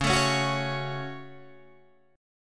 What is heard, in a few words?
C sharp Suspended 4th Sharp 5th C sharp down Guitar Open G